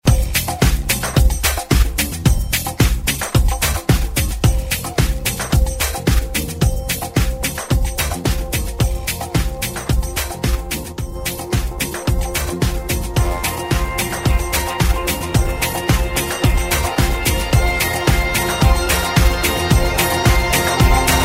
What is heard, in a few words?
Extended ReDrum; DJ Mhark